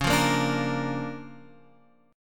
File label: D Minor Major 7th Sharp 5th, C sharp